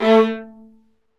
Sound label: String Sections, Violas Marcato